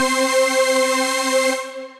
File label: Trap Stabz; Synth Keys; Ableton; Factory Packs; Beat Tools